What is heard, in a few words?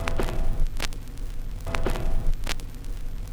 Crackle Loop, Vinyl Noise